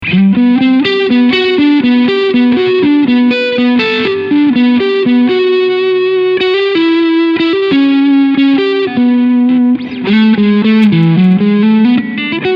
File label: Overdrive Midcut Hall